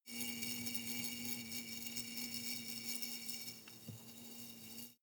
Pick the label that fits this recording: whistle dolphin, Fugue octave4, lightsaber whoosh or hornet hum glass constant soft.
hornet hum glass constant soft